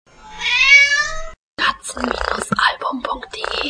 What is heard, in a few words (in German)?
Katze Miau, Miau einer Katze; katzen-album